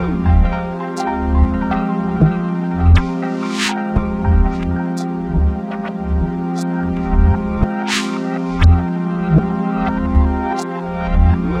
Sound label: texture bubbly looped; Polyend; Recue